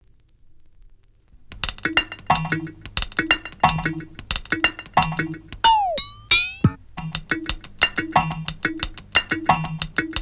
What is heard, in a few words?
electro rhythm